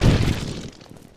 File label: wall crush; Ben 10 - Escape Route